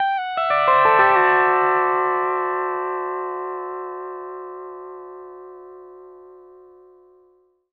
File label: PD LOOPS 120, Whole Lotta Country